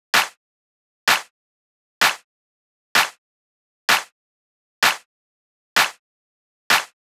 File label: Drum Loop 128BPM Clap; VEC5 Drumloops; VEC5 Multi Loops; Loop Elements